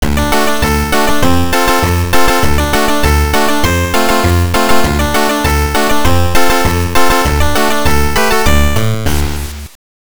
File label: Level Start; Asterix; GB